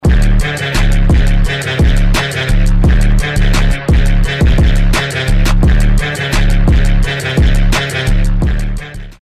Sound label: message notification alerts sound effect